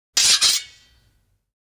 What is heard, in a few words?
sword scrape double; fights bodyfalls; Asian Show Sound Effects